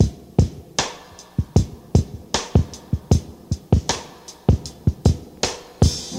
77 bpm fresh breakbeat g key, Free breakbeat - kick tuned to the G note. Loudest frequency: 953Hz; drum loop